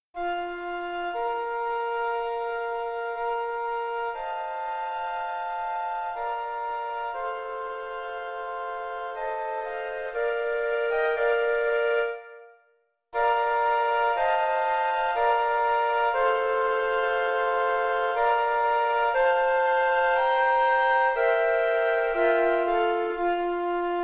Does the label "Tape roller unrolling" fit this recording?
No